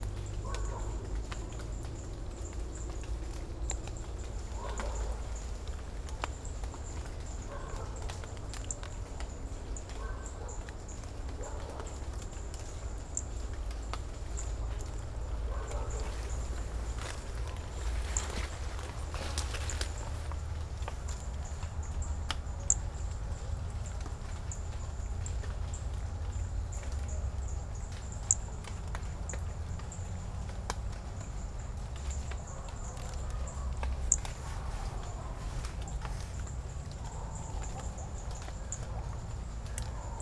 Brachycephalus hermogenesi parte do canto Morro do Cantagalo; Caraguatatuba